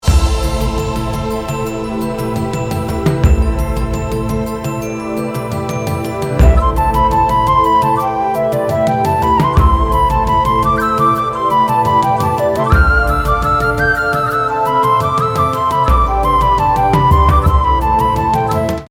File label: Jingle; Burgfest